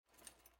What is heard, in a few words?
Foley Buckle